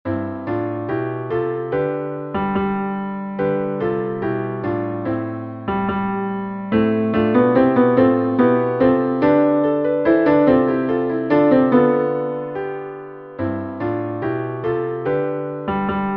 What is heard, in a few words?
musicroom bass; Eklektika Music